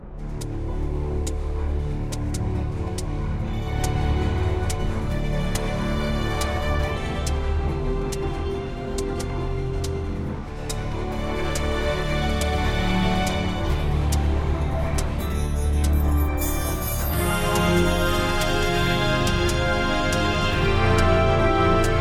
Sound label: cinematic score epic orchestral strings brass percussion grand heroic adventure sweeping; emotional; AI audio; Stable Audio; Stability AI